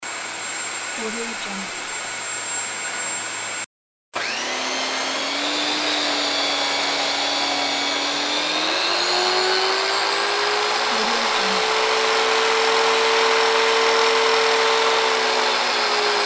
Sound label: electric engine; audiomechanica2